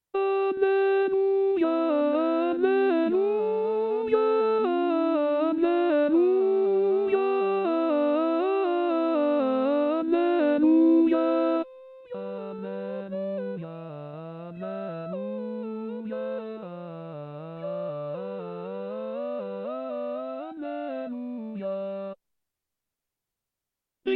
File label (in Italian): Inno Di Pasqua Bach contralti